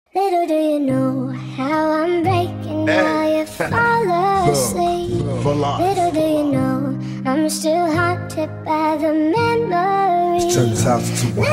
sad remix

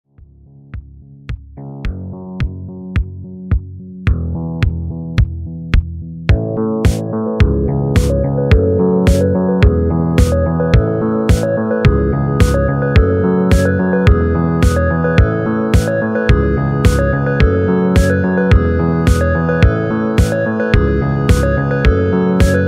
instrumental

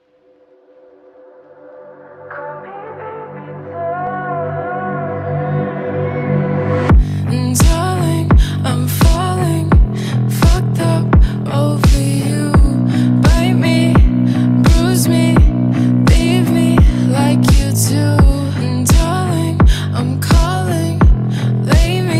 slowed reverb

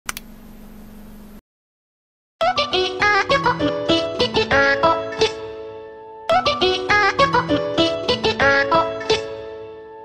oiiaoiia cat but famous phone ringtones

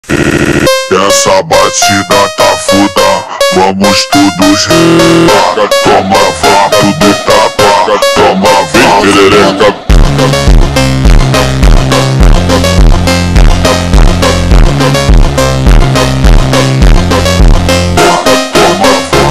slowed

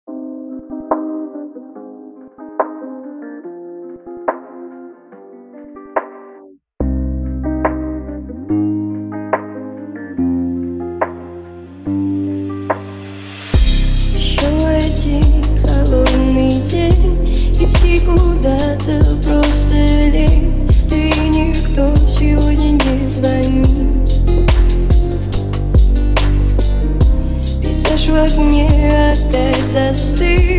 bass remix

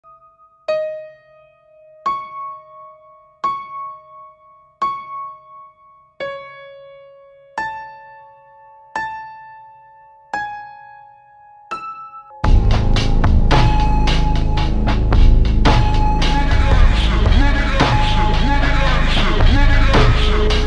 ultra slowed reverb

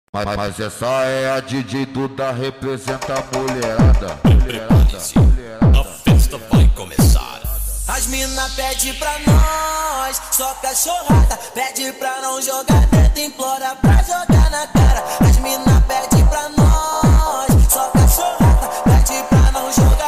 phonk speed up

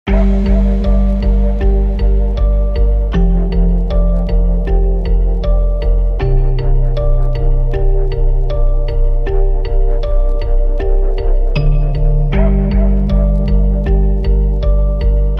instrumental slowed down

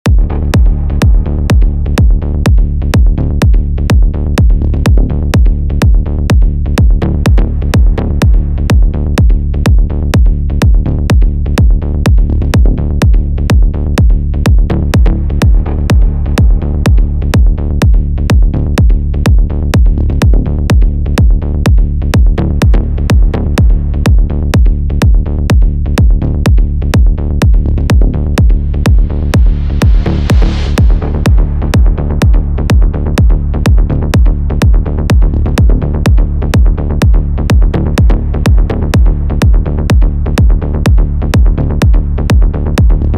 melodic techno

